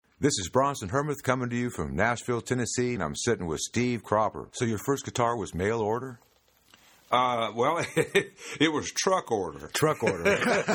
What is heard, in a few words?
guitar